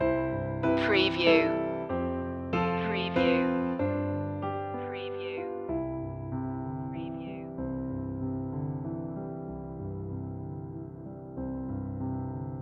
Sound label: orchestral loop; the first noel; media music now